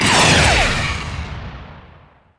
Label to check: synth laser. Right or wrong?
Right